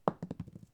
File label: dice Throw, Casino; Kenney's Sound Pack